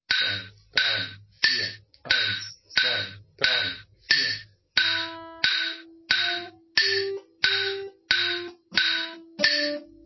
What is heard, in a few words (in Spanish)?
himno alegria; Himno de la alegría; Blues Scale Harmonica